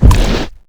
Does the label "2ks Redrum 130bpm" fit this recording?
No